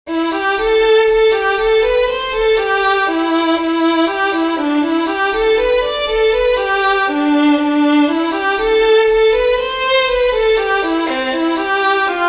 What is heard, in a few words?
Viola C